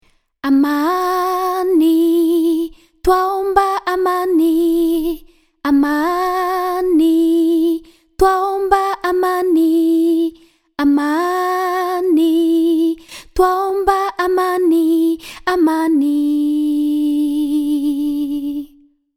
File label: Vocals Swahili